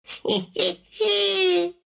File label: laugh, ru; dota2